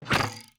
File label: string trem